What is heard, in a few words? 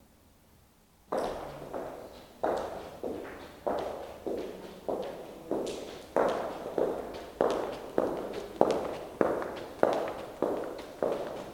High heels slow